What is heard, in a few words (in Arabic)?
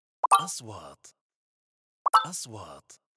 نغمة نقره الكترونية, transitions